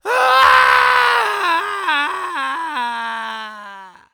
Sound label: Cartoon Human Male Scream Torture Scream; AdobeCC